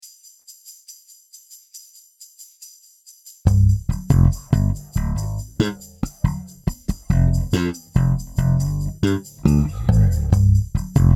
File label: Funky bass, 70 bpm